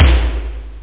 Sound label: toms, room; perc; OctaMED SoundStudio V1; jazzies samples